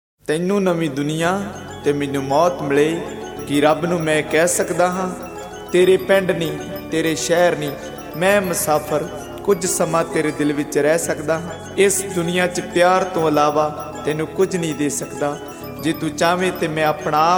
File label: Punjabi